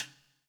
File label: POWER RIM